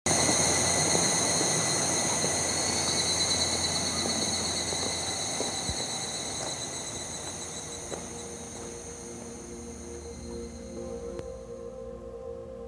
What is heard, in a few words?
sounds field recs loop